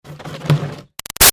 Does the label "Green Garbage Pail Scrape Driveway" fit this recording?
Yes